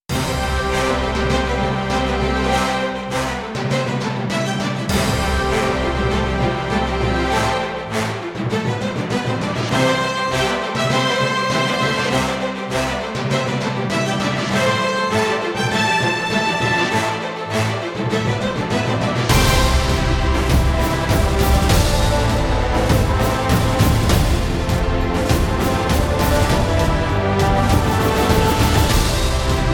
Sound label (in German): Orcs, Filmmusik; Wie der Soundtrack die Magie von Filmen verstärkt